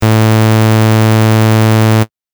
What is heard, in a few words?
saw